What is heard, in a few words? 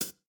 breakbeat